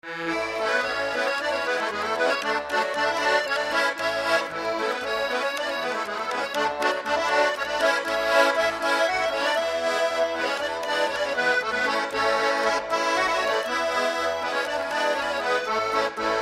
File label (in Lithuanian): Peterburgska polka